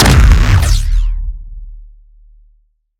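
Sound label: Sci weapon Shot Large Laser Planet Destroyer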